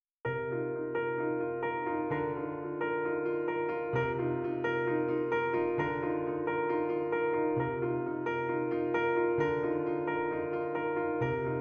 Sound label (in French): accompagnement